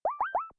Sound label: Call Joined